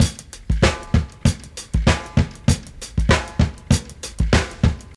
97 bpm drum loop d sharp key, kick tuned to the D# note